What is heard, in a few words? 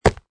Sports Baseball Catch Baseball Glove